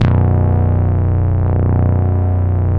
BARBARE BASS, Bass Analog & Synth; TECHNO; AKAI; USB Soundscan vol.12

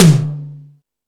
More Toms, Drum Machines

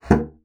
bassoon slap; Xsample VOL-6; C BASSOON

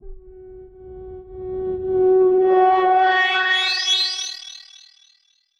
Filter Proc B 110, alarm siren samples, 110bpm; musicradar

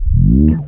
tape fx, scratch; Ambient Grooves

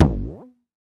Elastic Band, household rhythm samples Hits

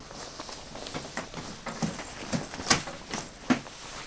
WEAR, HUMAN SE; AKAI S-Series CD-ROM Sound Library VOL-6

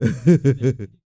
LAUGHS; Spectrasonics; Vocal Planet; Jazz FX

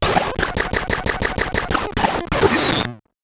Bent Talking Teacher, Circuit Bent

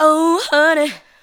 ADLIBS

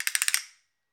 Casta, Clave; Club-50 - Foundations Roland; Wood

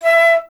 FLT FLTE, Flute; Miroslav Vitous - Solo Instruments VOL-2; Solo lnst. II

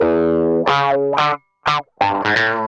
GUI 90 LICKS

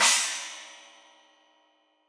China, Crash, China Cymbal; Deeply Sampled Drums; SMD Cymbals Stereo

